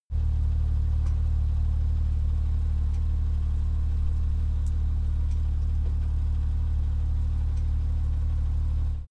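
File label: sports car exterior porsche gt2 idle; verkehr-transport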